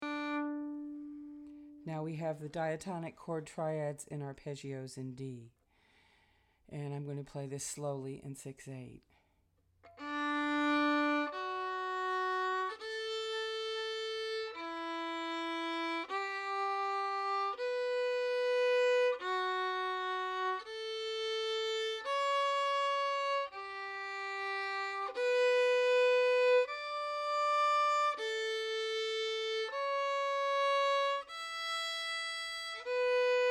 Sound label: D major triads